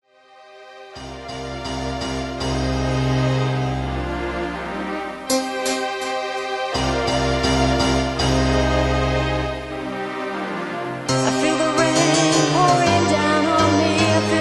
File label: KICK